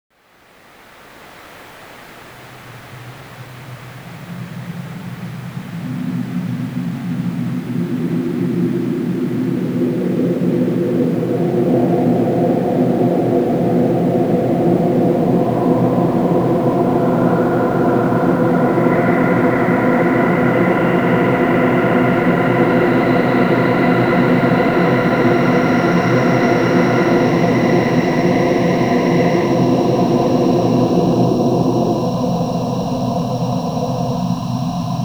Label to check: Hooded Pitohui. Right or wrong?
Wrong